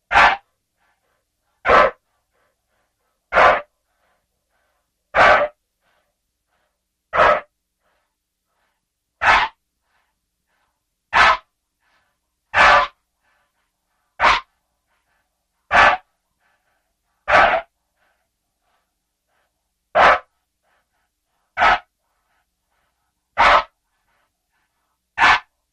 Car Park Tire Skids Sh

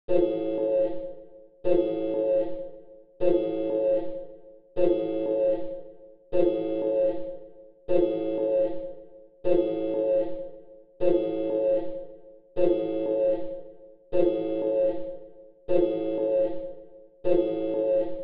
Alarm Collision Amb

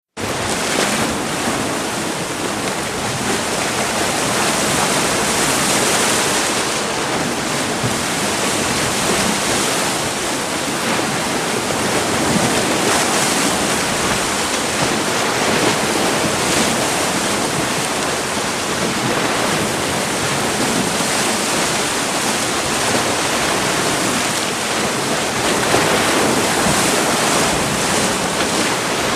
Hot Spr Stdy Roar Spla, geyser

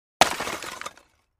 Clay Chimney Wall Hit, concrete & brick

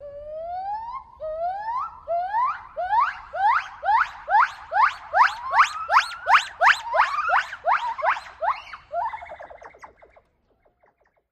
Gibbon Monkeys Whoop

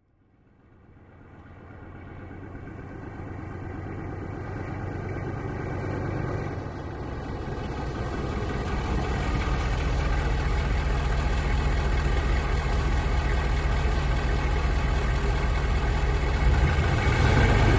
Humvee: Slow In, Idle And Away.

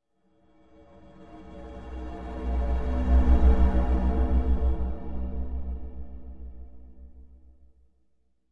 Music Eerie Flutter, flute

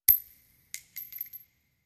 Shell Case Sngle Revb, shell casing movement

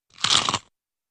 Big Crunchy Bite

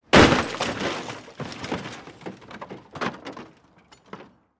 Vehicle Dropped On A

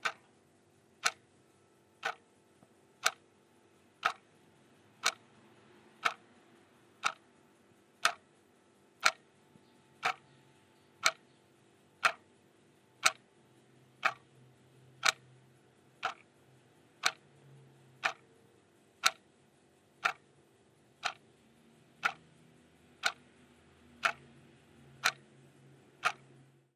Clock Tick Tack, ticks

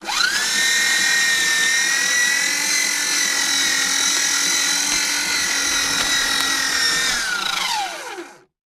paper shredder; fo papershreader

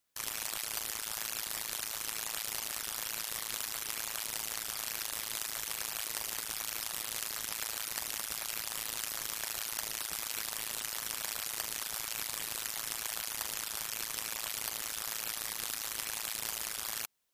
Steady Current, buzz & hums

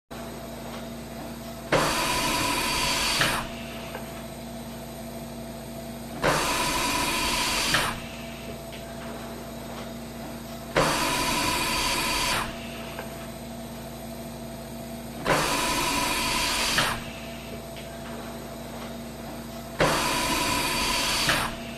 Respirator; Hospital

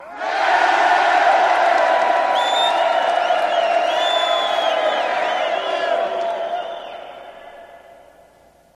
Crowd Hurrah Reacts, big arena

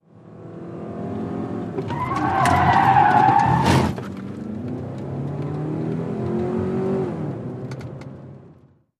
Sedan 180 Rv Skid Int

